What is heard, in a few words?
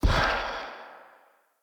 Fey Turn To Stone Bird Destroy; Powers